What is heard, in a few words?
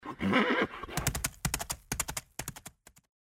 Horse; Age of Empires III